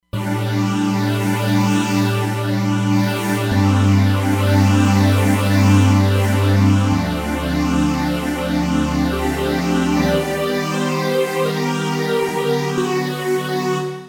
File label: Roland Fantom XR